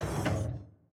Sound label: gate open; factorio 2.0.55